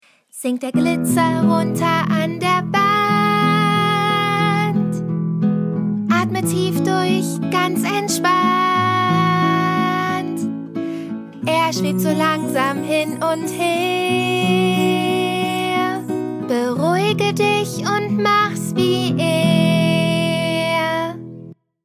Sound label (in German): Gesang; Gesang langsam; Esme & Roy